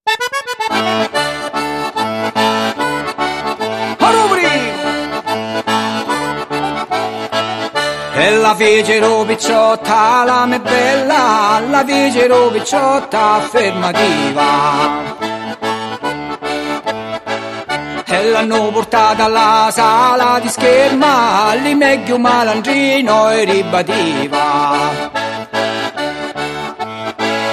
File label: Tarantella Calabrese No Percussion